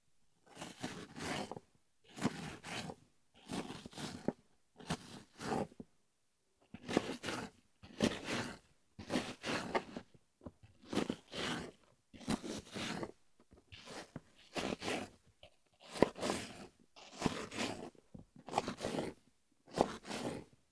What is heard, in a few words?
Chopping Apple Fast